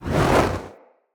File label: Player Torch Whoosh Fire; Weapons; Blunt